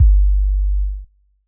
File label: electronic kick long; soundscrate